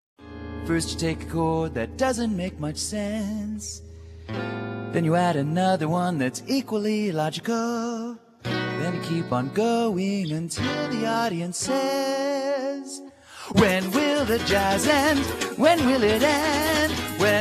jazz

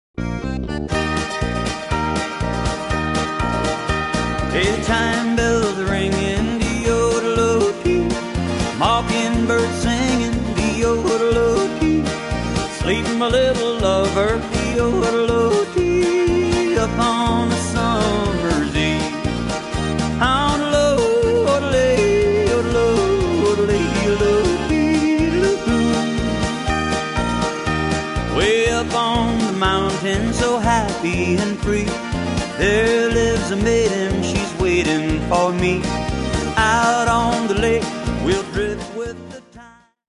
chime

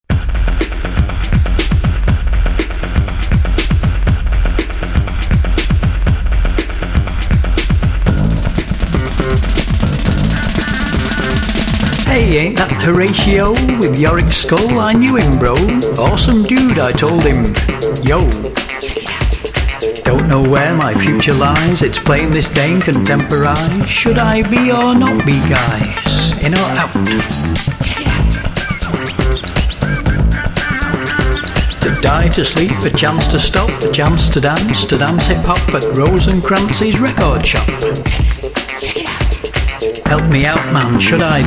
hip hop; hamlet; comedy poetry; Recorded live in the UK!

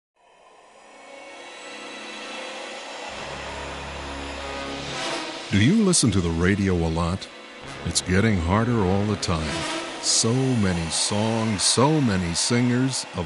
radio

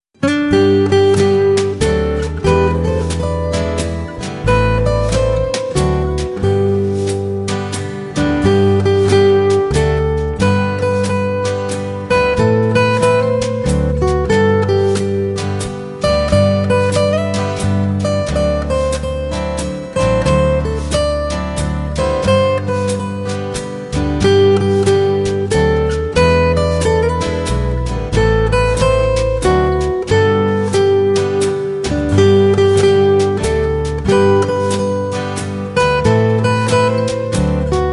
tannenbaum

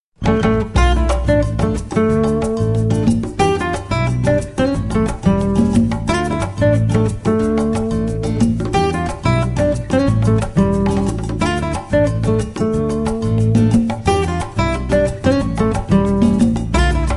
samba

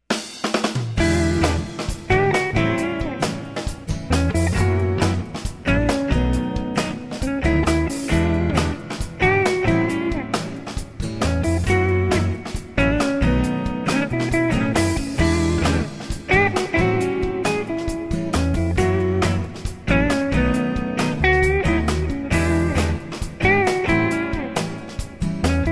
funky

